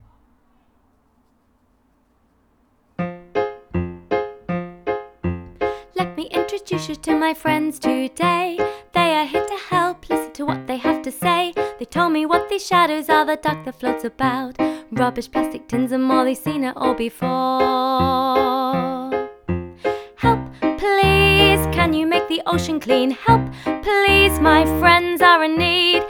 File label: with vocals; ks1 musical storytelling